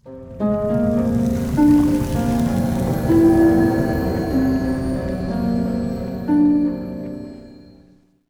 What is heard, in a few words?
battle harp long; macha